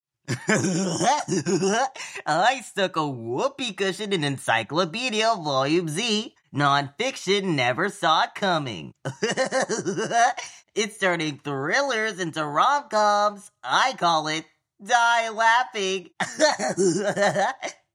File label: Giggles